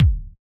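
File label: Bass Drum Analog; Yamaha DJX-2B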